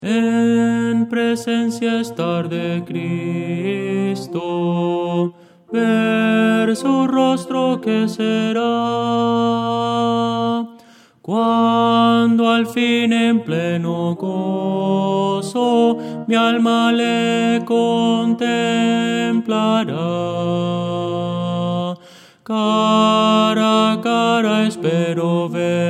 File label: tenor